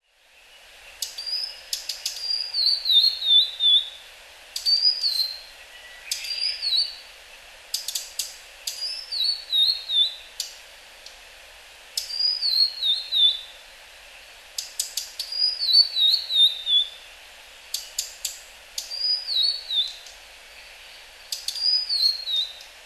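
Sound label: blue-and-white flycatcher, hyogo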